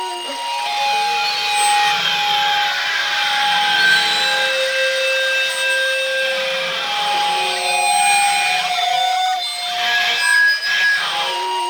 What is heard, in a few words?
Temple Feedback Loop; Defense